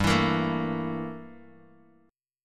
G Minor 6th Add 9th G down Bass Standard; Gm6add9 strummed; JGuitar

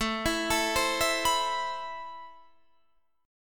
A-Minor Add 9th A; arpeggiated; JGuitar

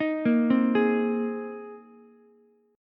C Minor 7th Sharp 5th, Ukulele; arpeggiated; JGuitar

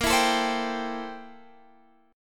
A-Minor 7th Bb; Am7/Bb; strummed

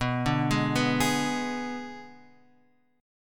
B Minor 6th B; Bm6 Chord {7 5 6 4 X 4} arpeggiated

